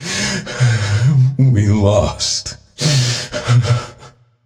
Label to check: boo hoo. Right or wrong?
Right